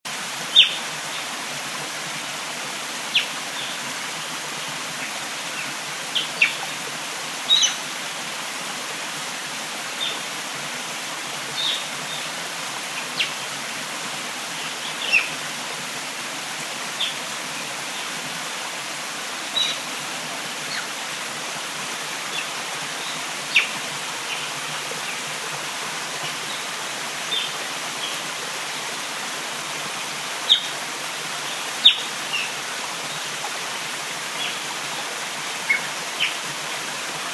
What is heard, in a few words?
swinhoes frog